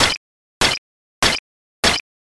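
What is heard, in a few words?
footsteps wet road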